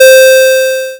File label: c5 loud square